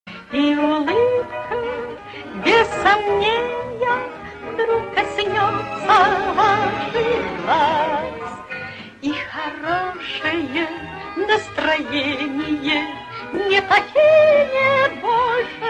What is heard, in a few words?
good mood song